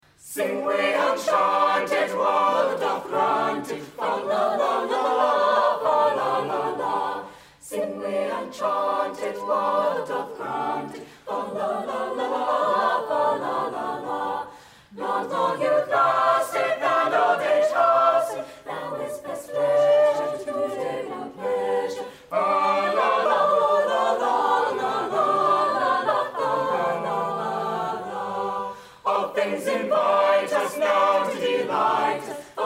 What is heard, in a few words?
Sing We And Chant It